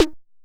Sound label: Bass Snare, Jomox Airbase99